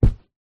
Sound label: footstep single boot carpet wood floor; foley; zapsplat